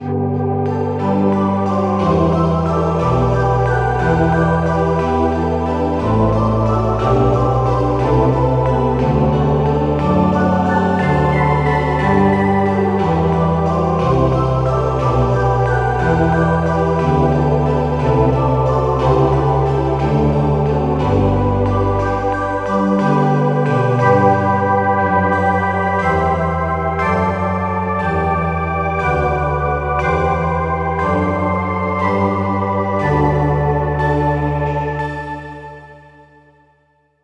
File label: echo drops